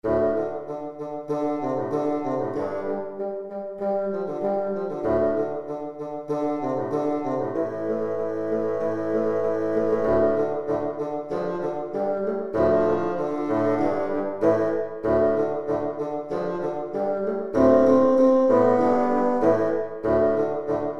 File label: woodwind hoerbeispiel